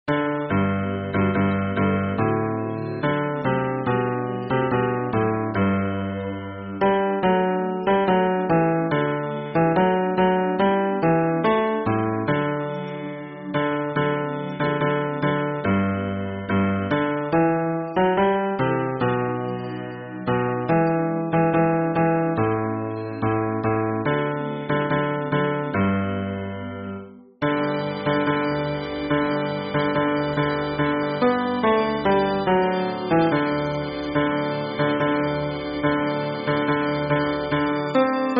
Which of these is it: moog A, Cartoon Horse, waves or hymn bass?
hymn bass